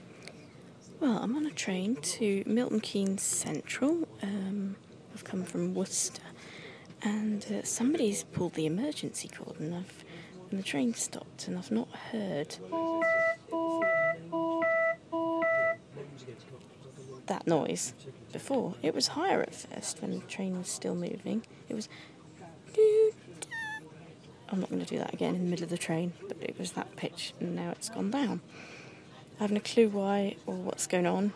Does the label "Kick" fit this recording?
No